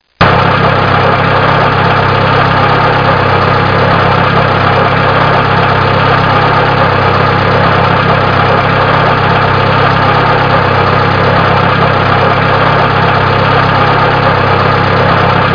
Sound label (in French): roulement a vide, vehicles; Pinnacle